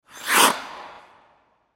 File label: Ice Skate Slide Scrape Indoor Rink; Ice Skating; Sports; SoundEffects-Blastwave